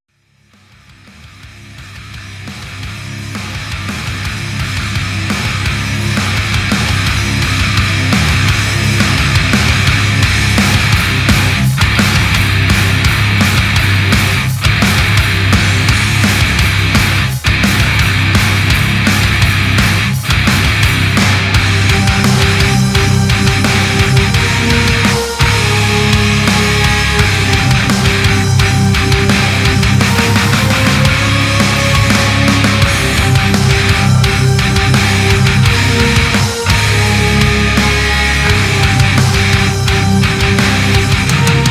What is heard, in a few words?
instrumental snippet